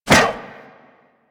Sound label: Zipline Brake Foley Contact; Gadgets; Parkour; Warframe